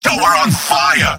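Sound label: positive vocalization, male; tf2; garrysmod; mvm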